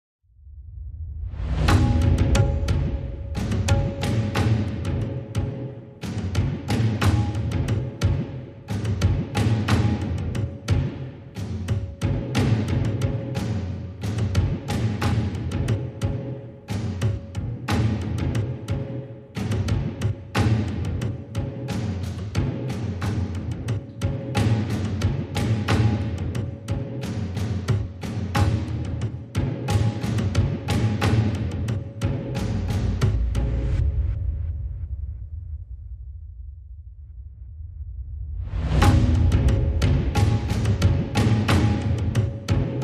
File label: Drums Only